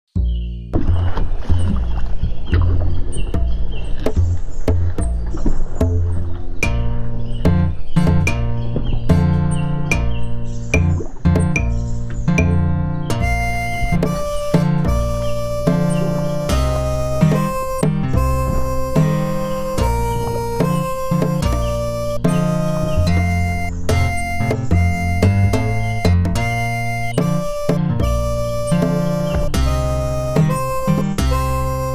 Lightly Row Practice Tempo B Flat

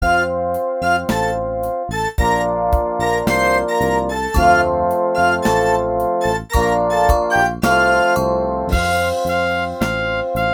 Dangerous Dangling D Practice Tempo E Flat

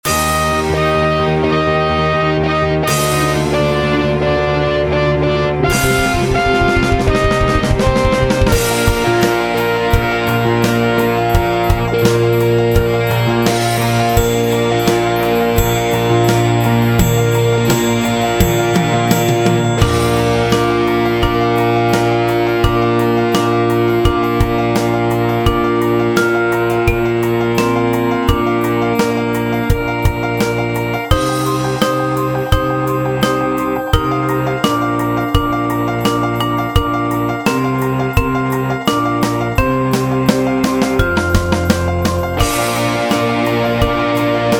Jingle Bells Practice Tempo B Flat

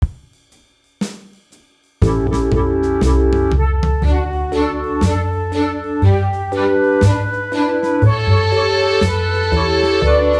violin and viola practice tempo

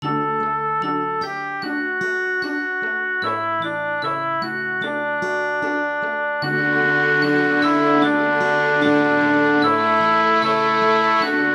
Lullaby cello

